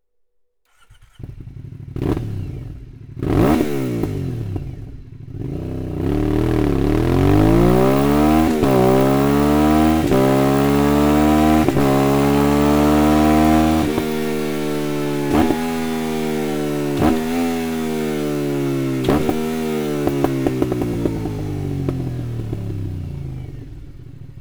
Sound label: sound slip on aprilia shiver 900, Akrapovic; Auspuffanlagen; Aprilia Shiver 900, 2017-2020